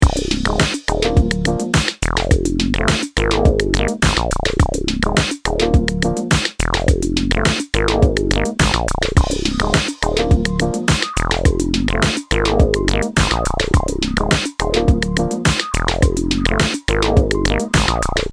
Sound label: Music loop